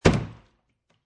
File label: Gas Can Full Placed Down, Foley, Gas Can, Full Plastic Gas Can Being Placed Down On Concrete; Set Down